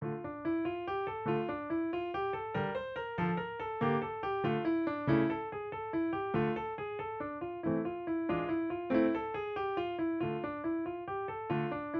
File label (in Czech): Etuda